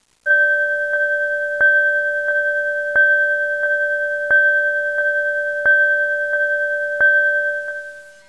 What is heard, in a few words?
ring